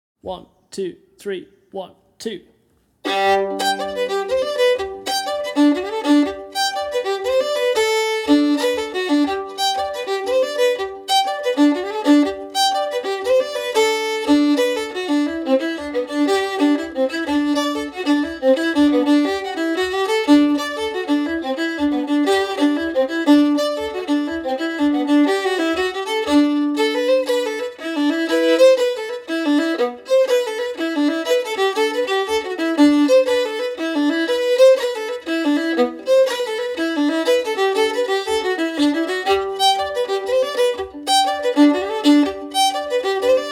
Danish Slipjig, Fiddle Full Speed

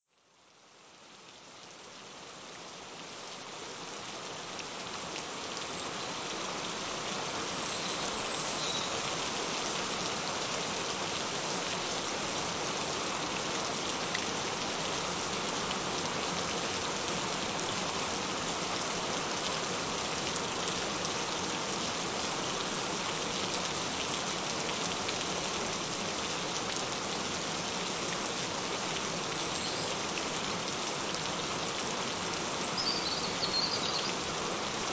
Gentle Rain Sounds; Full Body Balancing; Correct Imbalances and Feel Great in your Body

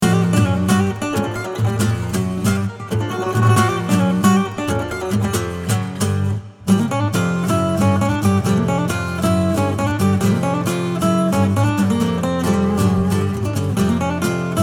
Acoustic; Trap Factory

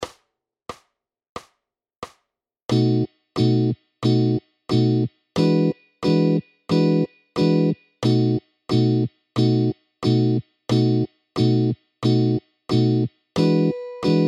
Basic Jazz-Blues 12 Bar Progression in B

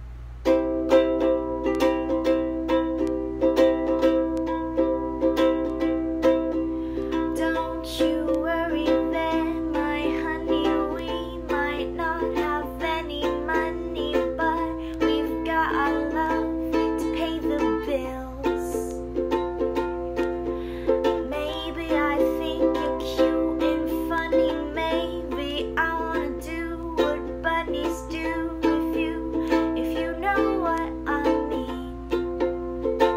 ukulele

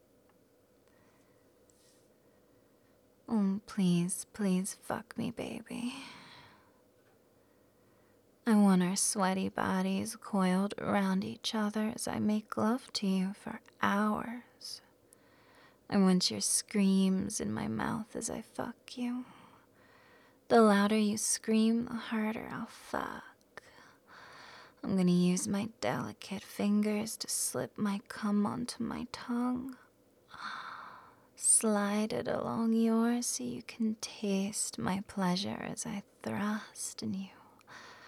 grrr

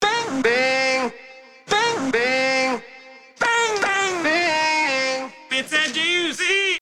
bing tone Android

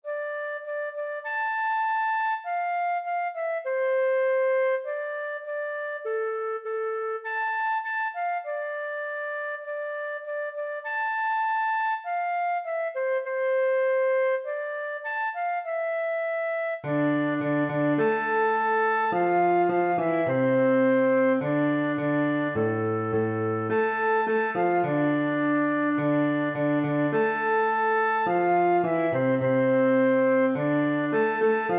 flute piano

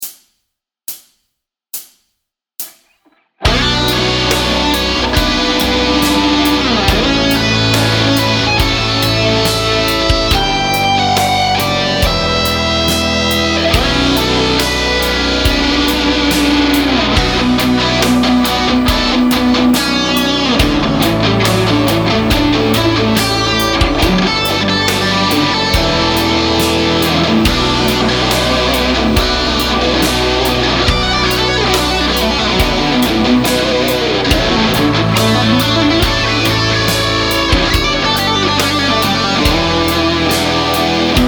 Guitar Solo

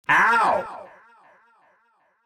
ow

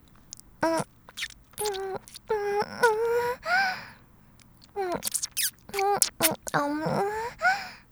kiss

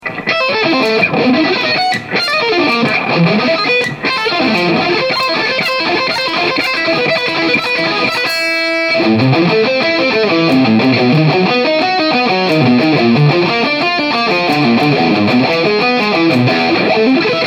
Arpeggio Etude; Arpeggio Assassin

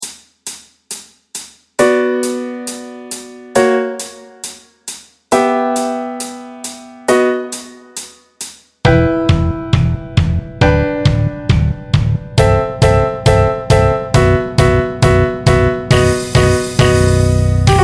Neoclassical Metal Challenge Backing Track

